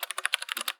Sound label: keyboard typing